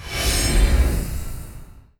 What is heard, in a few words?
double edge precast; weapons; dota; centaur